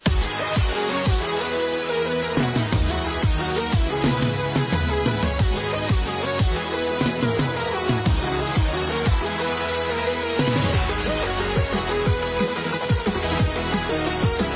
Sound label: BGM; Brazil; football